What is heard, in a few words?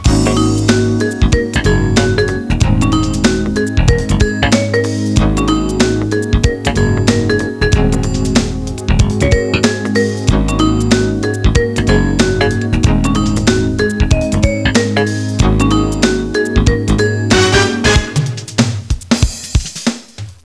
Music; Heavenly Hunks; Hunks Browser